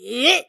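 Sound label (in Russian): странный мужской крик короткий подбадривающий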